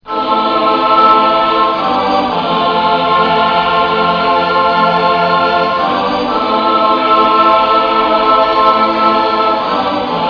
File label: Tron choir